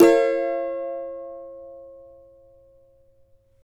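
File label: B minor, Guitars; Spectrasonics; Hans Zimmer Guitars VOL-2; CAVA CHORDS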